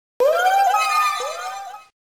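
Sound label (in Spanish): "Grito de Lumineon", Pokémon; Gritos de Pokémon de la cuarta generación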